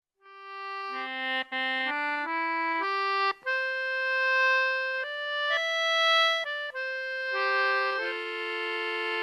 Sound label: sad music; spongbob